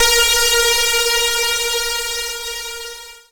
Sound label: PLUCKED, Synclav FM, A#; Big Fish Audio